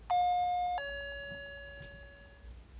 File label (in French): Interphone